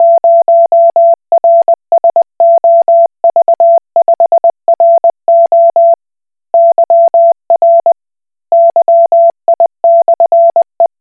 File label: Koch Morse